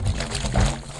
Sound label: slosh; Brewmaster